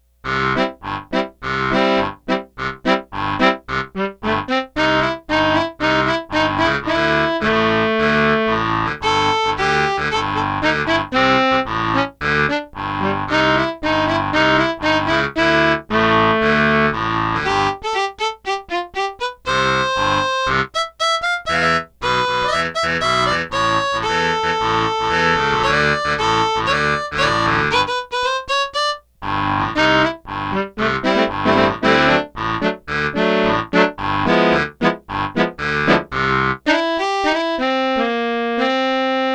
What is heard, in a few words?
BASS HARMONICA HARMONICA